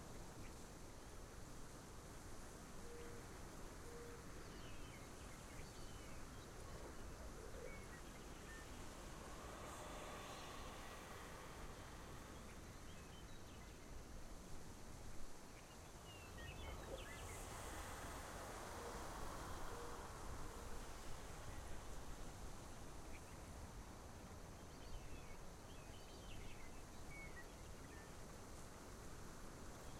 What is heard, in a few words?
amb lowhills; targon